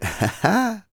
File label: LAUGH; LAUGHS; Spectrasonics Vocal Planet; Jazz FX